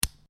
Lego Pull Apart Two Lego Pieces; Miscellaneous Toy Sound Effects

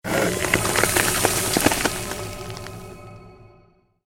CREATED MAGIC SPELL FREEZING TOUCH

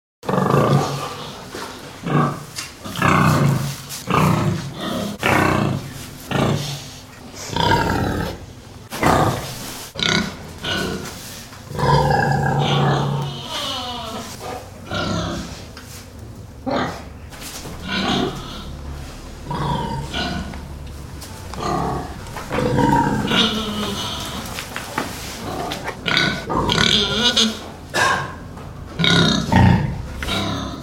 Pigs, Pigs grunting inside A barn.; Farm Pigs; Animals

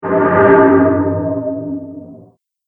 Cymbal Resonant Whoosh

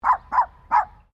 Animal Dog Poodle Miniature Bark Sequence

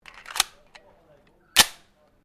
PISTOL TANFOGLIO 38 SUPER MAGAZINE LOAD; Handguns, non military; Pistols

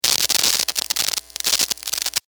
sparks, Electric Spark; Sustained Hum And Spark, From Guitar Cable; Zaps; Electronics

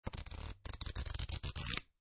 Cards Playing Cards Flipping Through; Playing cards, poker. Flipping through cards.

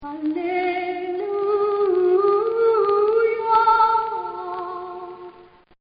Lady Sings ALLELUIA; Exclamations; Voice; Partners In Rhyme

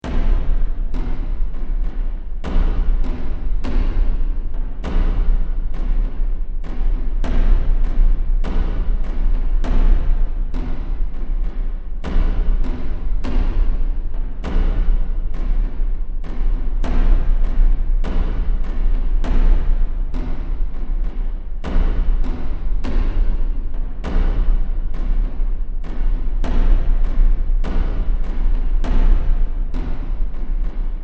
Drums Of Death, scary soundscape; Scary Soundscape Sound Effects

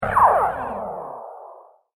Photon Missiles, laser gun

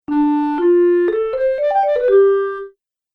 Happy Cartoon Musical Journey

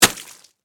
Mud Hit, Impact, Mud, Hit; Miscellaneous Impact; BlastWave

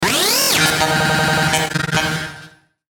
Glitch Ascend Buzz Chopper; glitches; Blastwave

